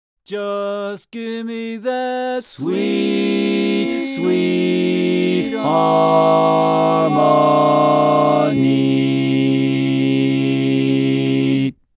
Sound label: Sweet Sweet Harmony, Bass, Barbershop